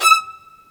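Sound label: MARC VLN B4, violin; AKAI S-Series CD-ROM Sound Library VOL-2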